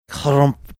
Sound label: imitating sound collapsing ground